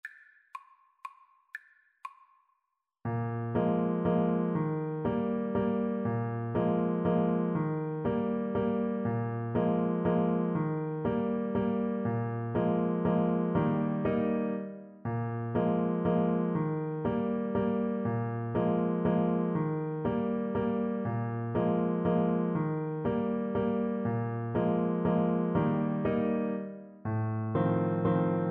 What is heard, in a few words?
waltzes trumpet